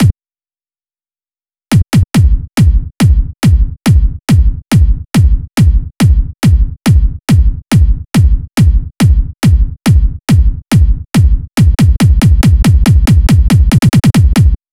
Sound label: Kick, 140 BPM; Vengeance Samples; Vocal-Hook Kits; Song Kit